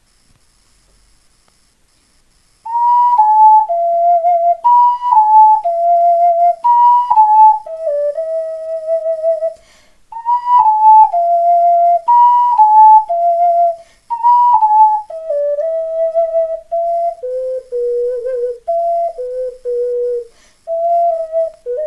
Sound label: ocarina